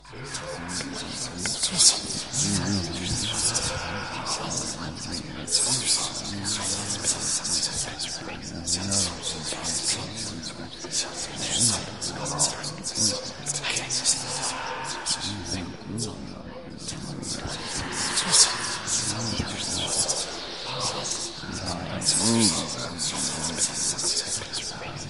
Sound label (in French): Murmures; terreur sur l'orient express